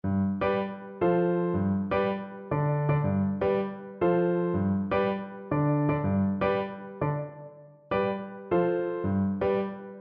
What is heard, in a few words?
viola